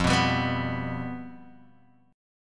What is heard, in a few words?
F Suspended 2nd Flat 5th, Guitar, Open D